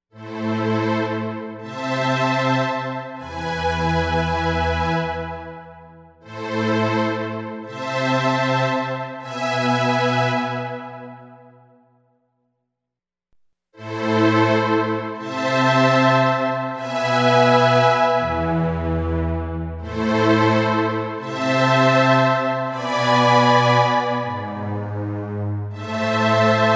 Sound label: MEDIUM HALL II